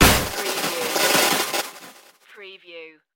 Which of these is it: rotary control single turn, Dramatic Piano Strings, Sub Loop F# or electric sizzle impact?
electric sizzle impact